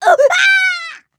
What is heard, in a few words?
death flying, grunt involuntary; covy involuntary